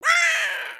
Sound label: Parrot Squawk; creatures organic